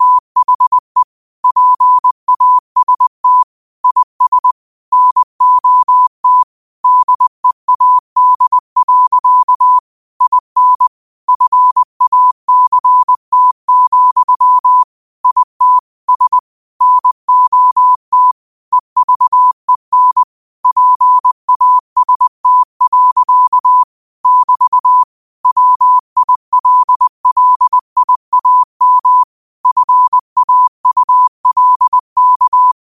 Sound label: 5 wpm, morse